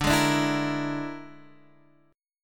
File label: Bb 7th Flat 5th D